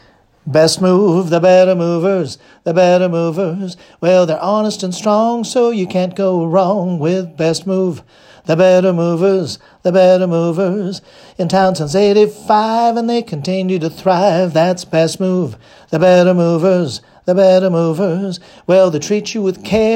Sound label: Jingle; BestMove